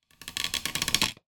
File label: wood squeak creak; foley; zapsplat